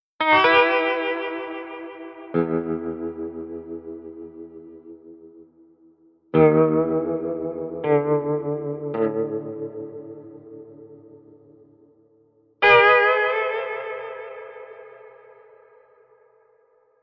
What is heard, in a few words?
tremelo electric ampd